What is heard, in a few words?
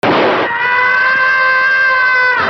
yell; tom and jerry